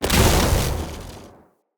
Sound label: Hunter Sniper Shot